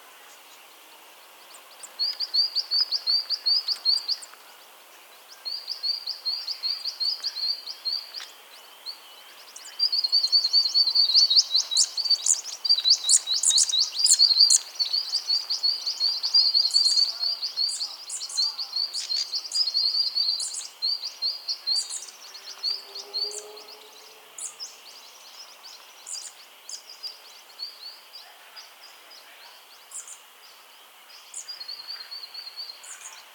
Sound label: Eastern spinebill